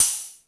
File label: High Hat, Drum Machines